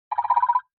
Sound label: Rattle